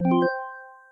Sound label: complete; flying ball